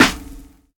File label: wet steel snare drum sample c sharp key